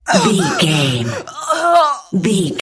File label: voice female death intense